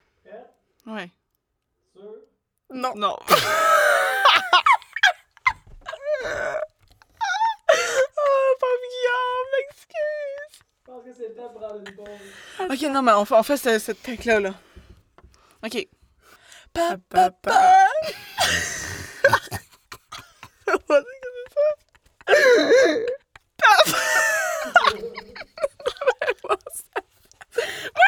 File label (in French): fou-rire